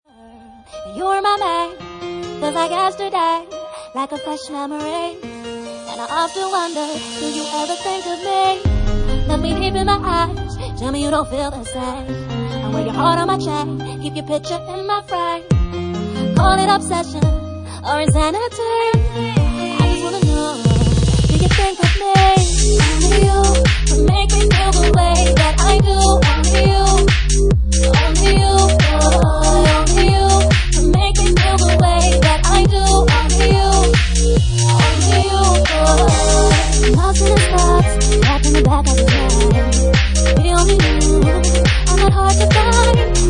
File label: Bassline Remix